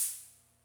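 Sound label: closed hi hat, univox; classic machines; wurlitzer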